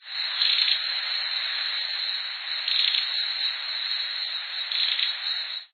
joao porca

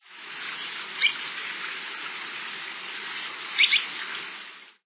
patinho

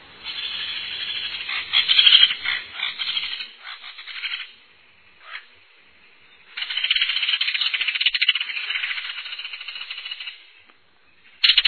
martim-pescador-grande

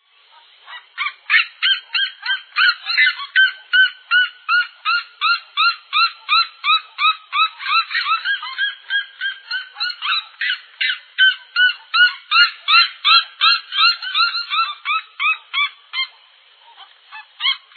seriema

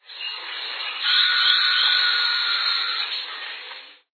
pica pau bufador

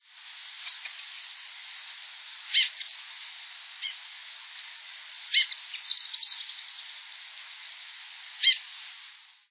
saira-da-mata

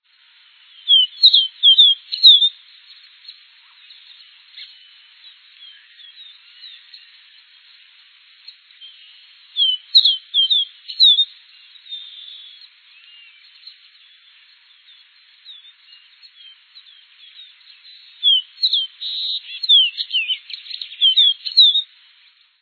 caboclinho

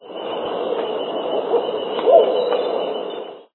coruja preta

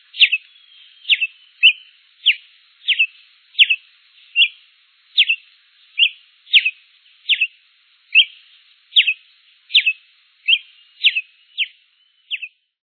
tie-preto

